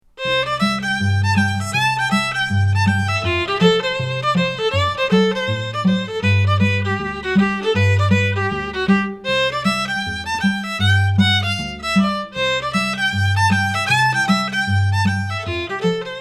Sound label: Stone Rag